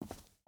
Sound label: footstep hide concrete walk; Rust